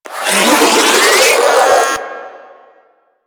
Spin Scream; Warframe; Proto Infested Ancient; Abilities

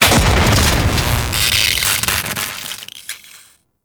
Security Trap Electric Explosion; Grn Forest; Veilbreaker; Grineer Forest Thumper; Kahl

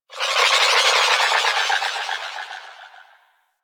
Exterior Distant Space Calls, Ambience; Warframe; Railjack

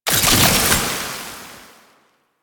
Alchemy Grenade Pickup Toxin; Warframe; Entrati

